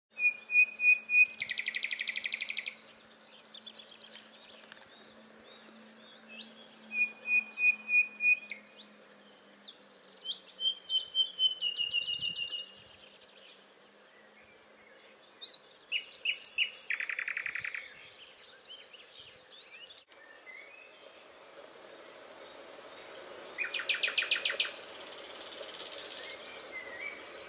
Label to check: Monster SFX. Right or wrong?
Wrong